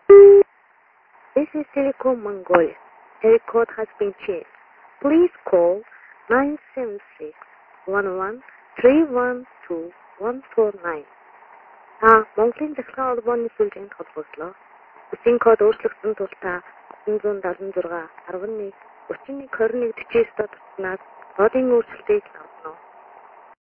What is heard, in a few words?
mongolia, international telephone sounds